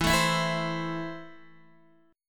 E Suspended 4th Sharp 5th E, Guitar, Open D; x,7,7,10,7,10

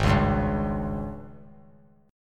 Ab Major 7th Suspended 4th Ab down 3C 3F 3A 3D; strummed; JGuitar

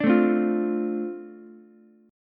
F 6th F down Ukulele; F6 strummed

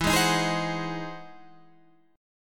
E Augmented Major 9th, Guitar, Half Step Down

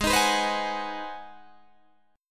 G sharp 7th Sharp 9th, Guitar, Open D

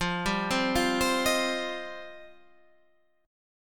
F 7th Suspended 2nd, Guitar Standard; F7sus2; arpeggiated; JGuitar

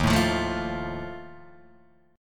D sharp Suspended 2nd Flat 5th, Guitar, DADGAD

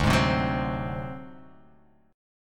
D 7th Flat 5th D down, chord; D7b5 strummed; JGuitar

